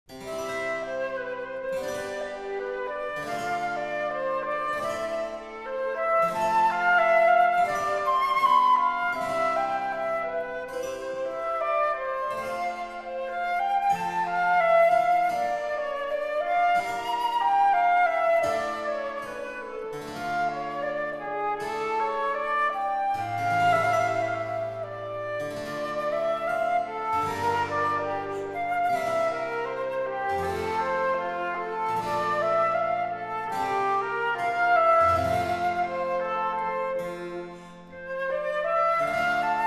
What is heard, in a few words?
Andante Sonata in C major for flute and harpsichord; BWV 1033; Bach; Sonate per flauto